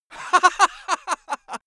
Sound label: male laugh bright